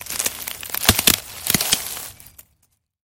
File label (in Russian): audio effekt tresk vetok, prirodnyye zvuki zimy; prirodnyye zvuki zimy zimniye audioeffekty